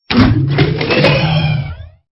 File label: Stinger; STINGERS